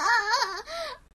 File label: Moan